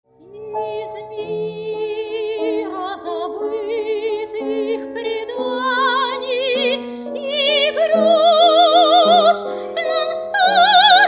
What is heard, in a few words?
vocal miniatures